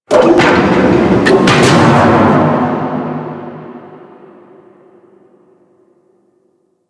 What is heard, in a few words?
Fortress Distant Gates; Ambience; Warframe; Grineer Fortress